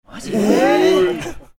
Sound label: surprise